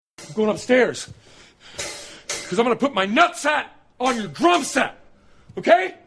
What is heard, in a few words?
drums